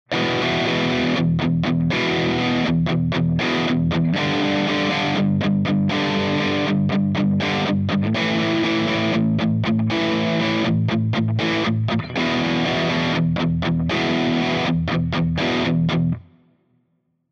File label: gt drive cable backing